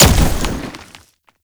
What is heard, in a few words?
Hit; Warframe; Uriel; Infernalis